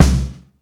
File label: rich top end kick c key